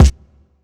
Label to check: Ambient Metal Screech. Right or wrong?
Wrong